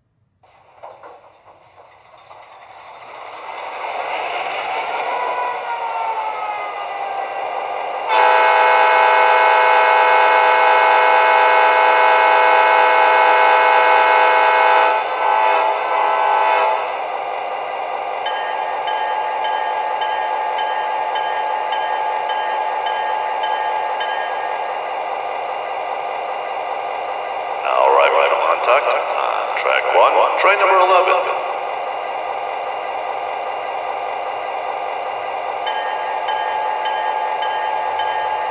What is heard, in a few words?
F7ABA